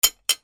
BATHROOM

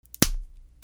BREAKING

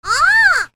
BIRDS

